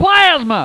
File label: Plasma